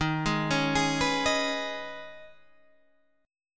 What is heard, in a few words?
D sharp 7th Suspended 4th Sharp 5th, Guitar, Half Step Down